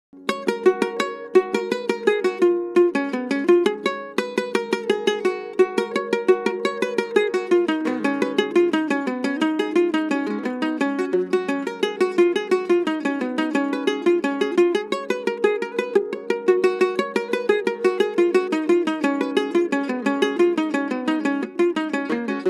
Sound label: Colas Guit Son